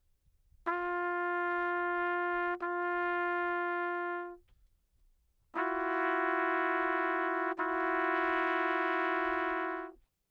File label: G to F#, G, Ab - Pitchfactor